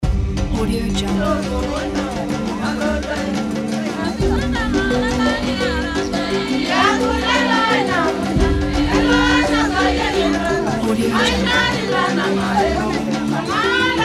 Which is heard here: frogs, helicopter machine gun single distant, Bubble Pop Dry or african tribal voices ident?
african tribal voices ident